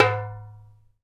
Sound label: DJMB, African Perc; Spectrasonics; Supreme Beats; African Contemporary; SB Afro DRMs